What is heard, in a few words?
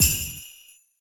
normal hit normal; osu!